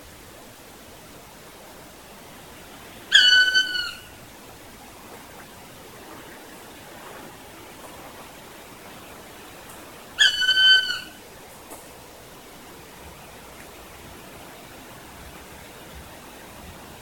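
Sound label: black woodpecker European call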